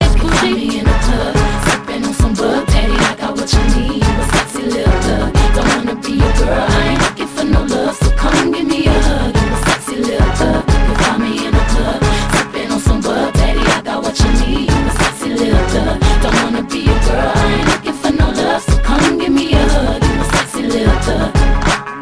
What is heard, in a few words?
Club Rpm